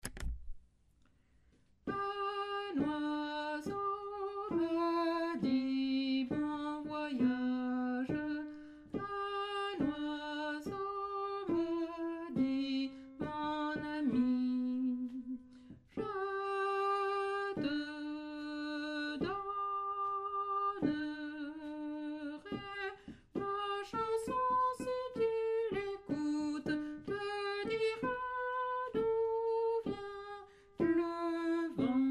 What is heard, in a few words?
alto; un oiseau ma dit